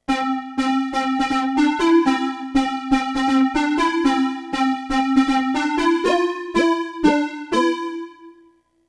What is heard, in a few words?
LEAD; vfx